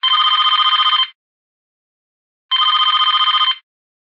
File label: 3D Phone, ringtone